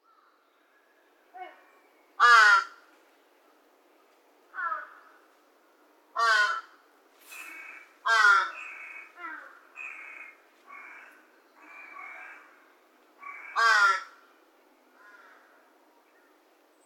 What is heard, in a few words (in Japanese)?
hashibutogarasu 幼鳥; kitamoto